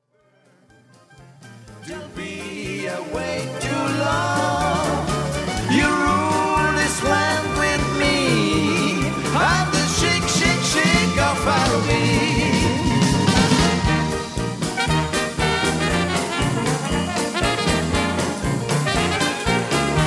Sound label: Dixieland Medley